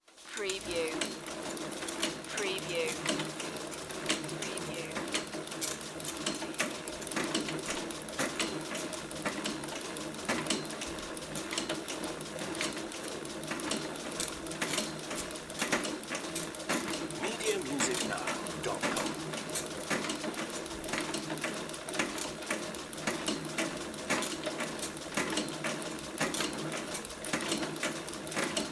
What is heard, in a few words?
Exercise Bike; Sound Effects Bible